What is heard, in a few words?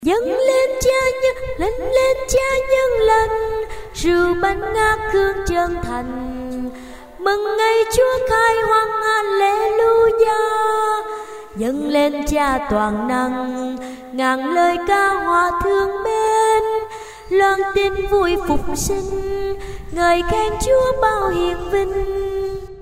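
tenor basso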